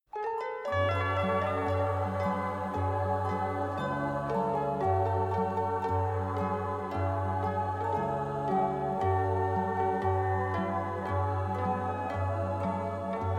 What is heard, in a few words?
Part One Acoustic